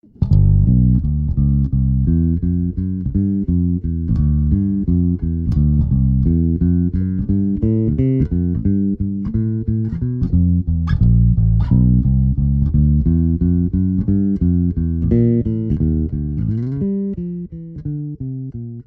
bass swing

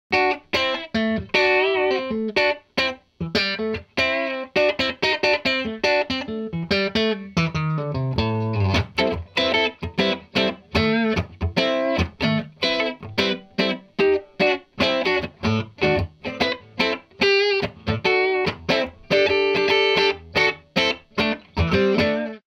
guitar funk

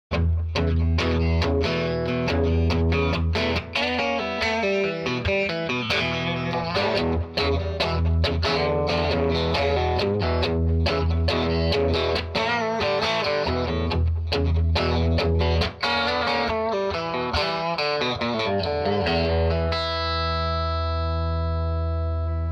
guitar rhythm

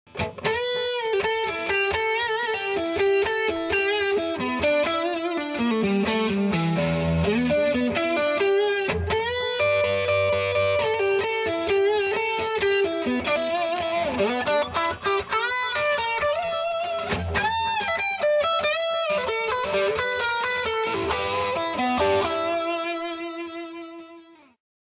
guitar rock

